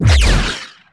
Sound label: phaser; Tanks